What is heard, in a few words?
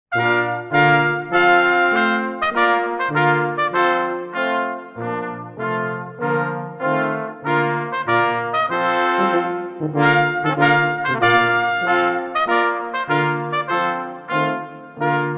brass trumpet tune; imperial